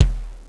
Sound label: putsplt, impacts; Wired for Sound Pro CD; Aristosoft, Inc.